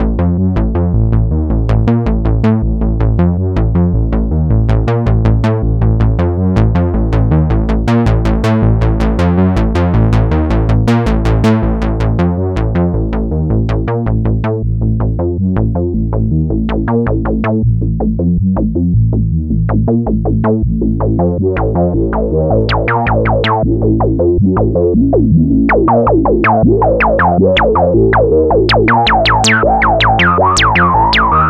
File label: moog bass tweaking 80 bpm; arturia matrixbrute